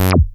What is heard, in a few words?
SHORT ACID, BASSES